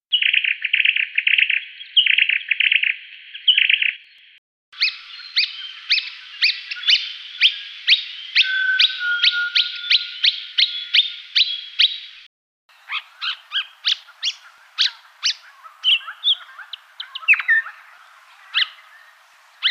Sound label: fuscous honeyeater; honeyeaters; canberra garden birds